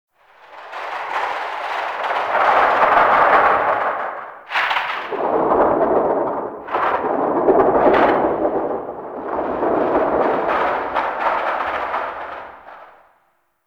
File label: Exterior Void Energy Crackle, Ambience; Warframe; Railjack